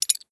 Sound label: rattle, grenade; props